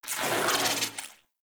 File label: Shock Rifle 2D Tail, Weapons; Warframe; Corpus